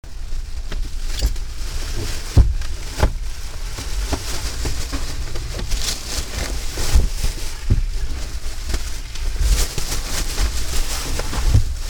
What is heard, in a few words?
Unpacking Car; Richland WA